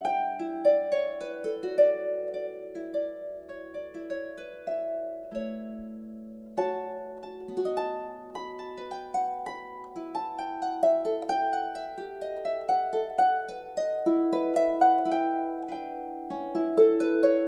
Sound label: Gavotte and hornpipe celtic